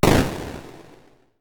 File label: enemy explosion; space shooter